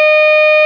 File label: bass C, pipes